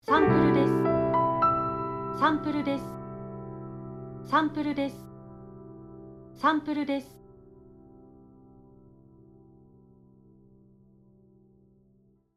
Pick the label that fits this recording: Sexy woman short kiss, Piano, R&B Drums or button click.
Piano